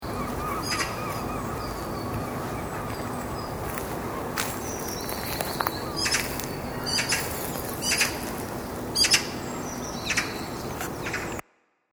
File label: magpie